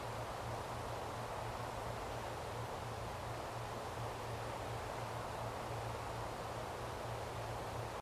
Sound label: Monastery Exterior Loop; horror and atmosphere fx; Silent Hill Downpour